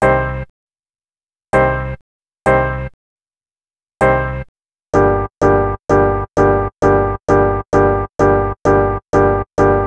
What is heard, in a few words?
Melody, Hip-Hop